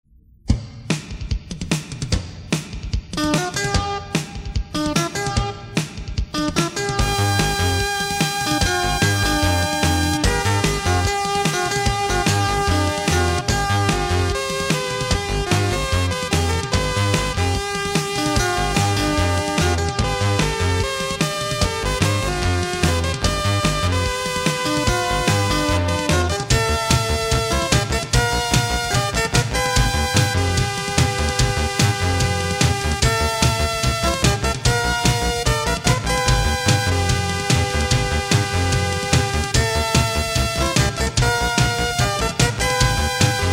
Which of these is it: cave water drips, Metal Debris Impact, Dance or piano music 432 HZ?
Dance